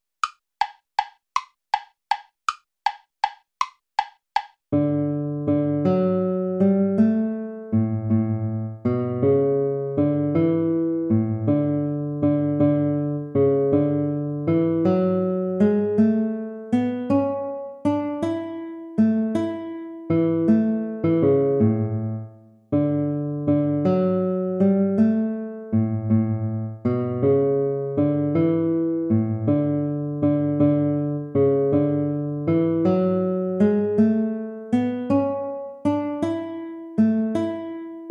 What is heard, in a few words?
siciliana play along